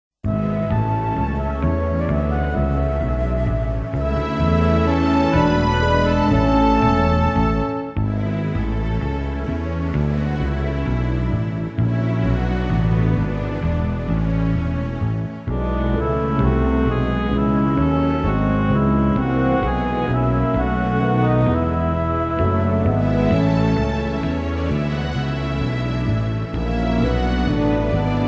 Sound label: School Song Instrumental